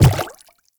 Infested Footsteps Quadruped Medium Walk Water; Warframe

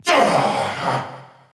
Pain Grunt; Warframe; Nightwave; Season Three Glassmaker; Nihil Fight

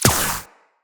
Shield Impact; Tenno

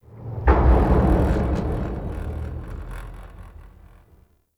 Ship Rumble; Warframe; Corpus Ship Remaster

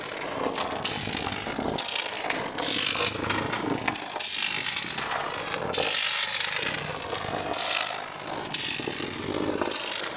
Electrical Hazard Out Of Service Loop; RailJack Hazards

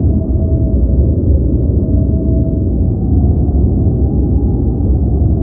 Clan Dojo Ext Space Amb; Warframe

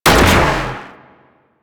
Shotgun Fire; Warframe; Weapons; Corpus

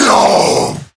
Death, Male

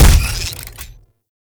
Shot Fire; Warframe; Weapons; Afuris Prime

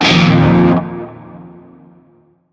Shawzin Blues Chord; Warframe; Narmer Shawzin

